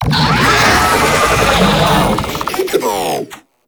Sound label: Idle, Vocals; Warframe; Proto Infested; Babau